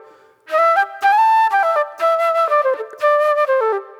Soul Plate Flute

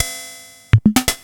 Yamaha VSS-100; Sliced; m8

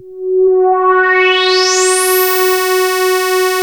MOOG, BASSES

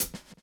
groove; AMG Gota Yashiki Groove Activator

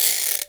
Ratchet; Roland M-OC1

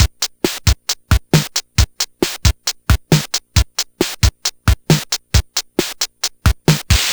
Game Boy Break Loop, 8-Bit, Drums

